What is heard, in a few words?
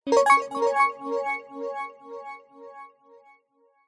multimedia game sound nature game positive